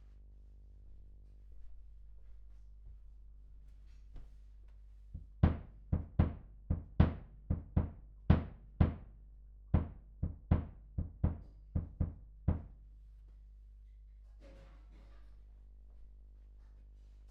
Voc Omni

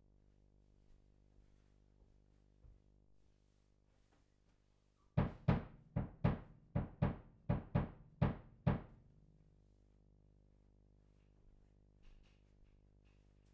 Bass Mic

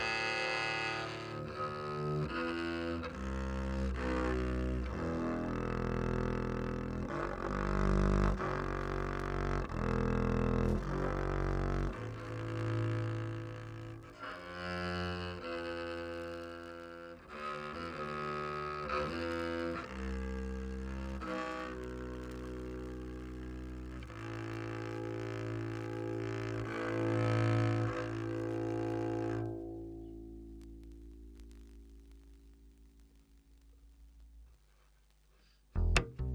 Bass DI

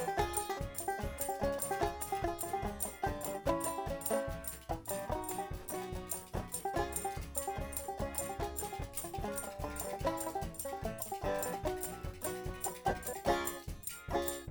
Banjo

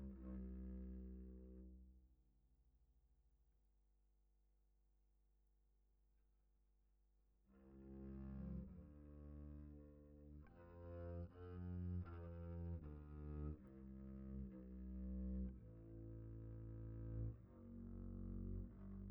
Bass DI

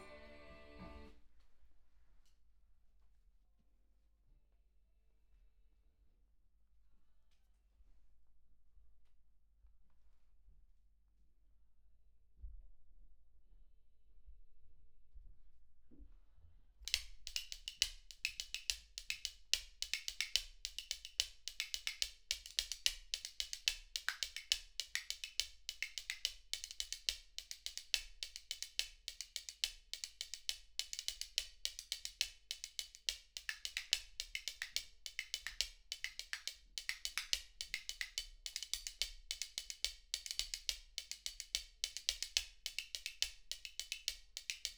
Spoon

